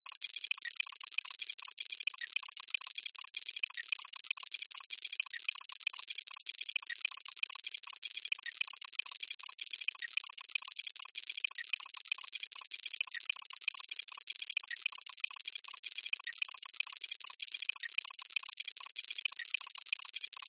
Science Fiction Computer Process Beeps; Computers; SFX Bible 6

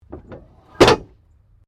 Train Points Manual Operate

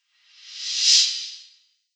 Thin Reverse Whoosh; Whoosh Sound Effects

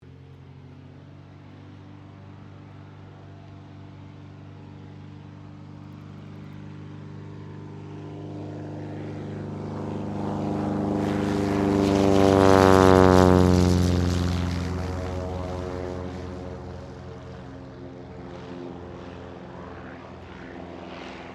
AIRCRAFT CT4A TAKEOFF; CT4 military trainer aircraft; Airplanes; Vehicles

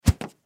Rope Whoosh Drop; Rodeos; Foley; Blastwave

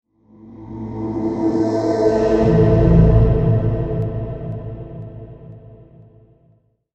Tomb Of Darkness, scary soundscape; fantasy; role playing games, magic videogames; Andrew Fleming

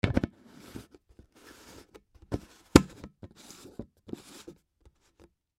Container Screw On, Container Screw On Lid; Lids; Foley; Objects; Blastwave

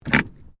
CAR TOYOTA COROLLA HANDBREAK ON, hatchback; 2009 Toyota Corolla; Cars; Vehicles

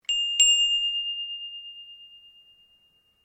Bell Handbell Priest Japanese Ring Double Loud; Bells (Sound Effects:Musical)

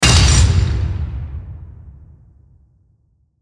Hit Metallic Slam Heavy Warble; Production Element Imaging Element Accent Transition

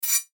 Whoosh Slide; Multimedia; Blastwave

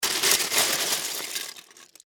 Legos Pick Up, Handful, From, Box, Plastic, Toys

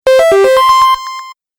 Game Positive Win Award, video game

